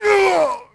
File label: Player Pain Grunt